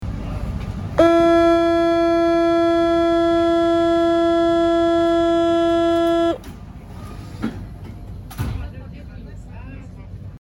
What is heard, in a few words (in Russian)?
signal zakrytiia dverei metro